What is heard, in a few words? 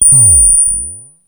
ODD RM BELL; Zero-G Ambient 1; ANABELL PERC